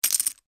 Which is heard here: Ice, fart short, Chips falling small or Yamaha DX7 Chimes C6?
Chips falling small